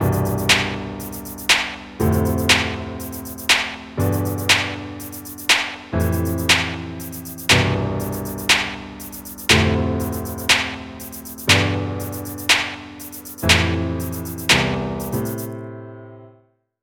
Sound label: Transition Piano